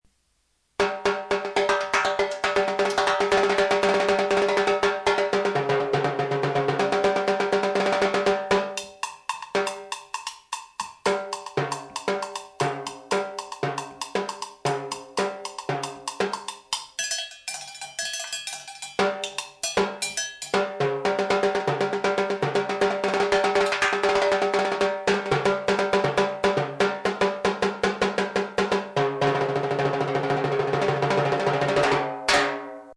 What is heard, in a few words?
timbale; drums; Vince Plays some of his favorite drums